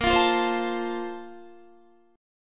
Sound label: Bb Major 7th Suspended 2nd, Guitar